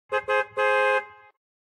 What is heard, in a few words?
Bus